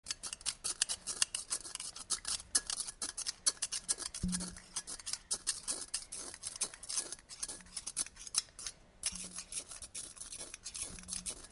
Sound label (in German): puderzuckersieb